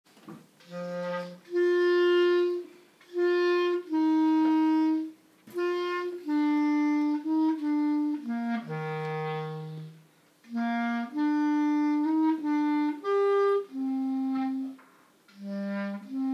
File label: clarinet solo